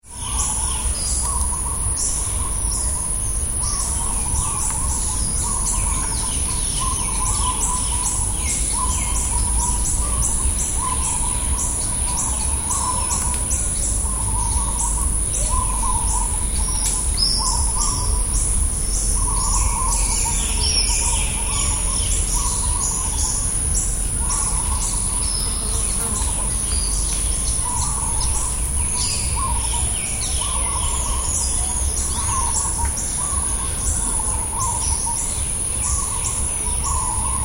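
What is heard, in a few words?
Tropical Birds and Insects - various bird calls; Light birds; Jungle; Tom Hutchings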